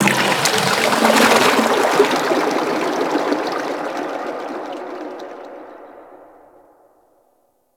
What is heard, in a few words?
Loot Crate Water Release; Warframe